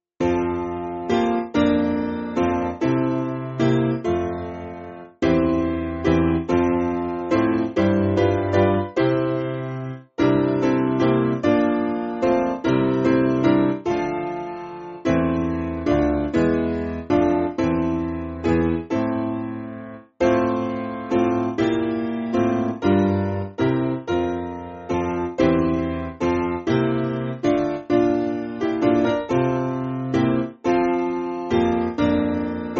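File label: Piano, Music Backing Files for Churches; Holy Offerings Rich; Redhead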